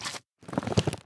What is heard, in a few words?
jump, magmacube; mob; Minecraft